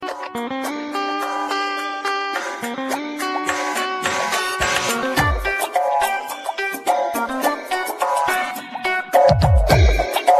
guitar string; ringtones; latino

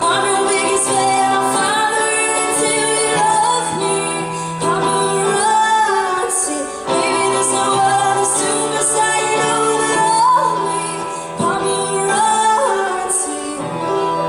Is Calling Rap, ringtones

